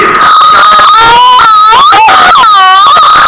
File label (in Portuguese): gemidos